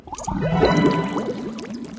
bonus symbol show; Hungry Shark; Wazdan

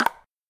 plus button; buttons; Xing Yun Jin Chan

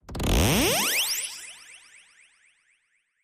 draw

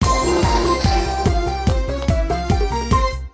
win; Enchanted Cleopatra

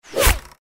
shoot; Ming Imperial Guards

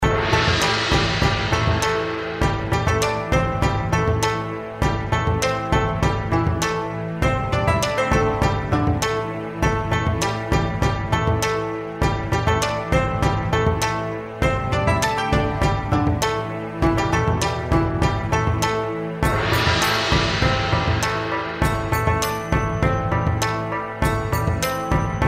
triple dragons reelspin bg

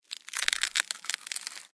trex egg crack; The King Of Dinosaurs

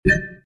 bet change; games; poker; American Poker The Legends II

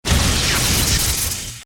UI electr; Paradise

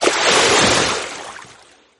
bonus symbol water out; Hungry Shark; Wazdan

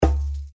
reel spin click; The Apes

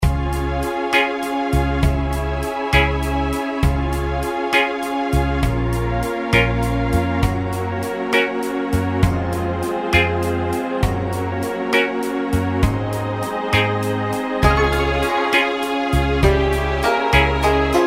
reelspin bg; Golden Shanghai

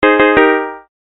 win seven; Multi Win; multi card win

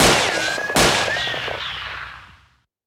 highwin gunshot; Wild Guns

